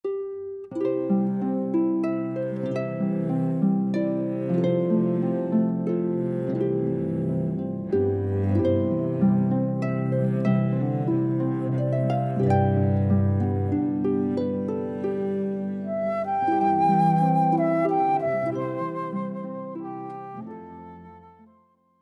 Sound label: Amazing Grace, harp flute cello